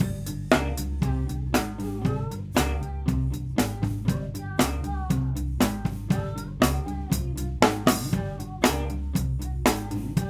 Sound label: Overheads